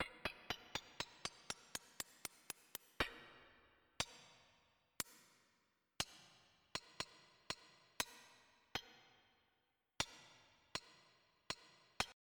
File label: sword; Populous Demo